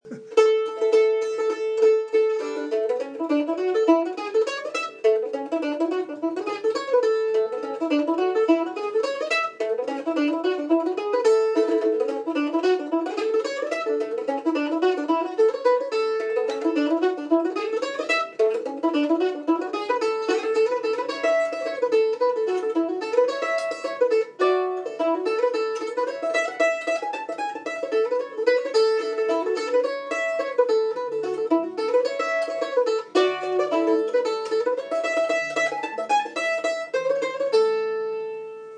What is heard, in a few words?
Hornpipe